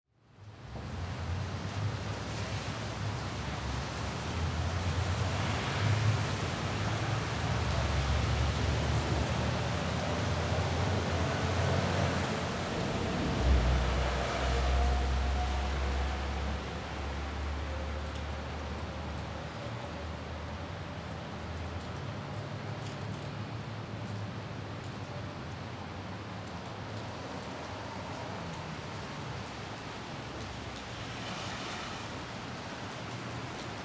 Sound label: Ambience City With Rain 180D; AdobeCC